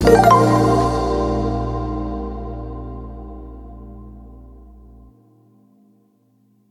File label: Retry Jingle; Friday Night Funkin' The Origami King